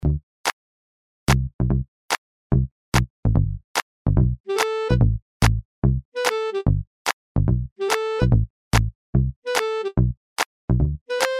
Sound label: Easy Stroll alt mix